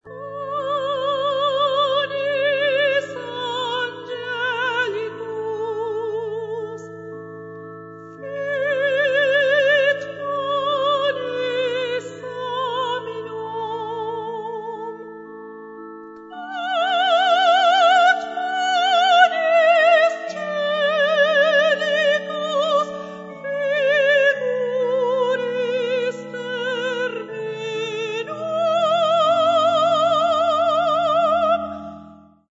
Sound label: Panis Angelicus; Franck; repertorio para bodas